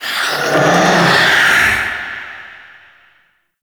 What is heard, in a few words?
Reaper Vocal Turn; Warframe; Wraith